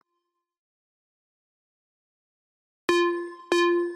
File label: sound machine sample; hof furni; arcturus morningstar default swf pack